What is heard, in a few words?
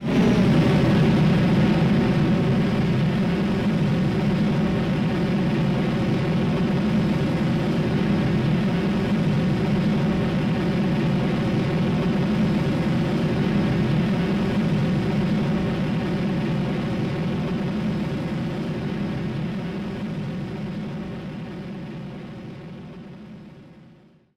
Rocket Long Launch Loop